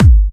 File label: Bassdrums UK Trance Kicks; Vengeance Samples